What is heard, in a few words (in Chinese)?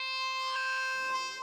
哭声; 学院长; 赛马娘